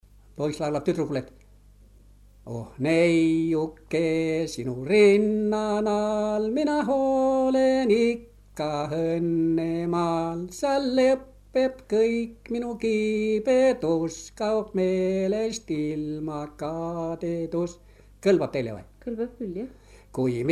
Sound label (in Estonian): poiss laulab tydrukule